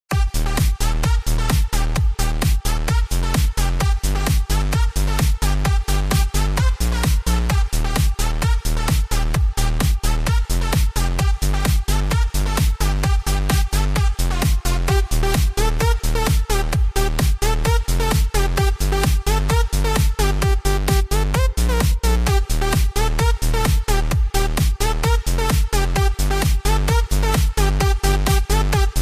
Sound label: Techno Remix